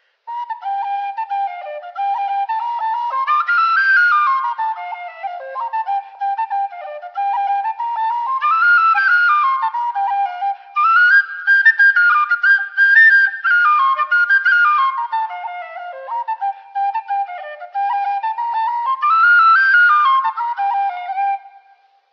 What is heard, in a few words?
Freeman C Blackbird Hornpipe, whistles